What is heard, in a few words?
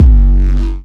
Kick